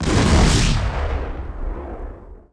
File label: boom; roadwars